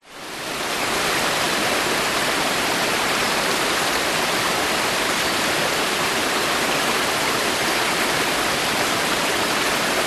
nature creek fast flowing rainforest light birds bg; zapsplat